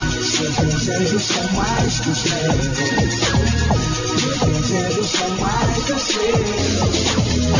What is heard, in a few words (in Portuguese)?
instrumental fundo de musica